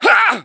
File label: pain